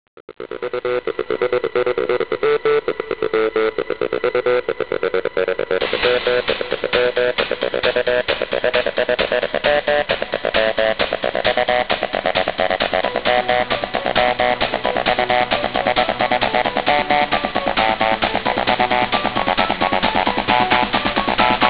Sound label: club